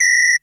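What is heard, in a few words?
Samba Whistle Low, Drums, Roland MT32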